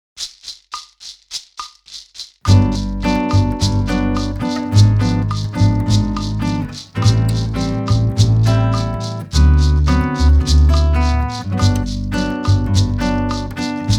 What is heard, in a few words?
Bossa